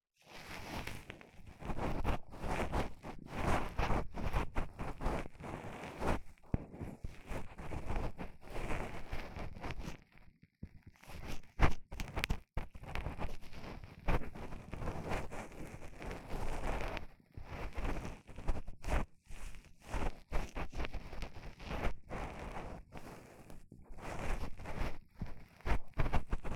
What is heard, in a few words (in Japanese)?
綿棒 だけで 耳かき を する 音; 耳かき音玉てんこ盛り！！03～声なし就寝用音源～